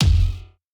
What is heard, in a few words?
breakbeat